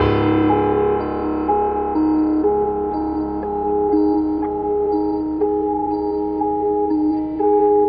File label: piano keys, Loops Sad